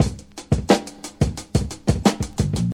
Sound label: 88 bpm drum groove g sharp key, Free drum beat - kick tuned to the G# note. Loudest frequency: 1130Hz